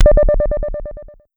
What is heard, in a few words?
Pollard Syndrum